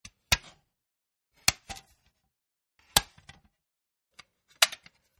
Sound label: CD Slim Case Open, Cd Dvd Slim Jewel Case Open; 4 Takes; Cd Slim Case Open 02; Snap Sound Effects; Objects